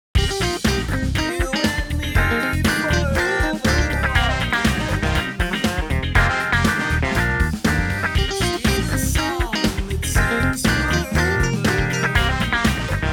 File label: SONG DELAY OFF